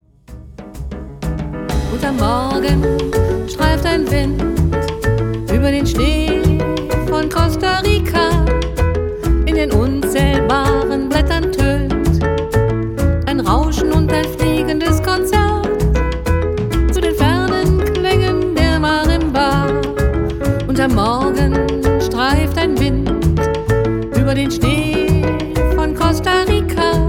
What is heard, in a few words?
marimba